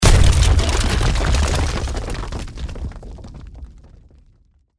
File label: Fort Falls; Age of Empires III